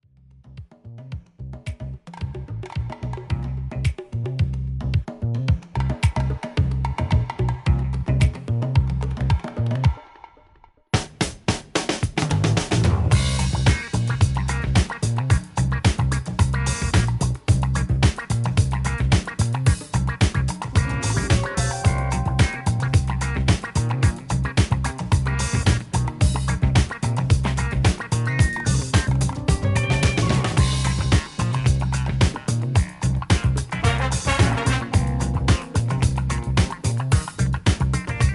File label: Orchestra